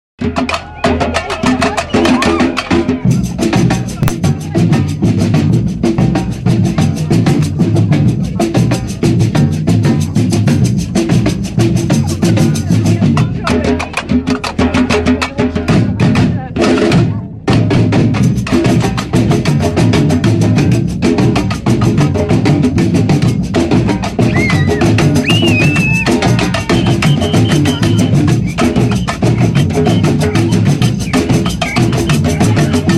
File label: sambassadors of groove with dance at bromyard folk festival